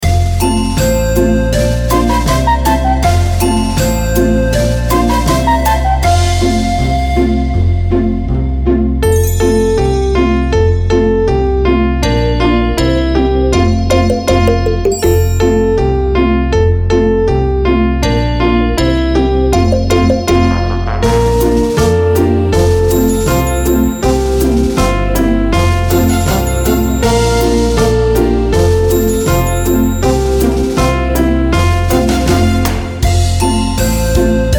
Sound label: karaoke